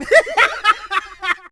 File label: Laugh; vocals ru